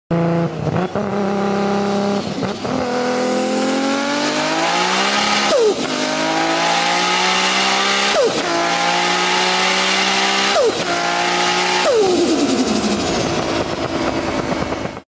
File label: nissan gt r; اگزوز